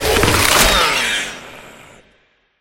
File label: headcrab death; scripted sequences; hideout